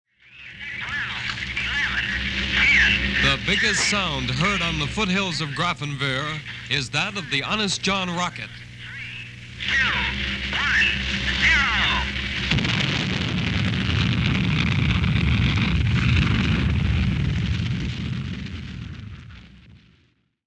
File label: rocket